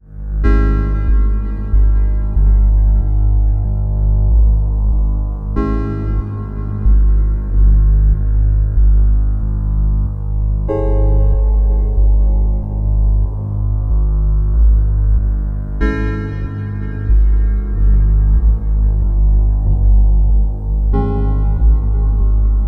chords, live recorded experimental soundscapes, modular synthesizers